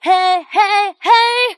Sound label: "hey hey hey" SUNG, voice; ooh ahh